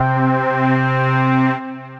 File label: Slow Roll Power Pad; Synth Pad; Ableton; Factory Packs; Singularities